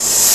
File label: Hat